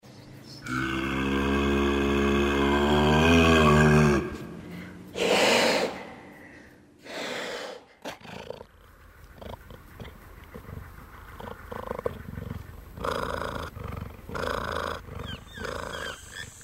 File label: demonic sounds; fiat light show vatican 2015